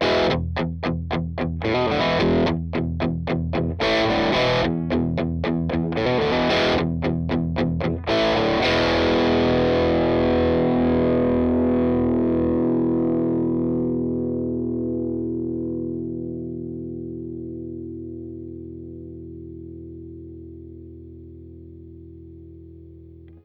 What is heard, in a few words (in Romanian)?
Distors Cablu Mogami, Distors Cablu Mogami 8m; Line 6 Relay; wireless pentru chitara de la Line 6